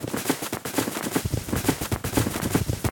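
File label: spin loop; smite; kuzenbo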